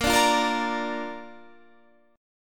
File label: Bb Major, Guitar, Standard; 18,17,15,15,15,18; Bb strummed; JGuitar